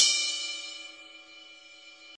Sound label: CYM RIDE, DRUMS SINGLE HITS